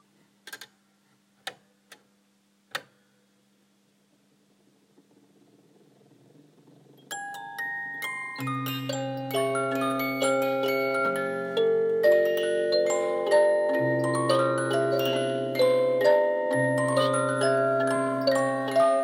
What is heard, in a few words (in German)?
musikdose; drehorgeltag 2018